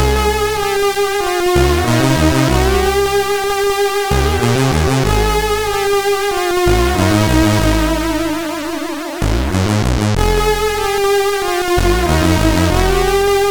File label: Get sad, sid CSG8580R5